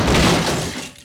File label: crush, Impact